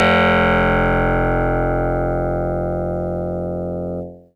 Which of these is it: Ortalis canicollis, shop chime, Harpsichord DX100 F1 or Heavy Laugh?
Harpsichord DX100 F1